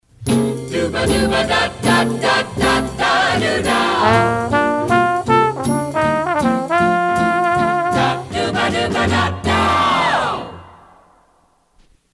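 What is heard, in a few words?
First Half Spot Breaker, jingle; WABB; (First half of Sig)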